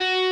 Arp.

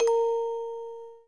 orgel

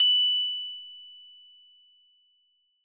glockenspiel F#8

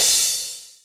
Cymbal G

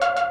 synth; osu

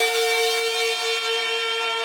synth main

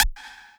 lead a

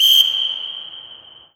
whistle end

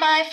vocal

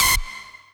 lead

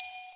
Bells

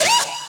scream out A#

